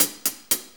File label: 6-8 HiHats, HiHat Ride Loops; Drum Tools